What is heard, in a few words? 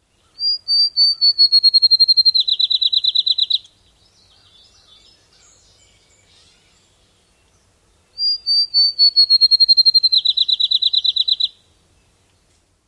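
song, Field Sparrow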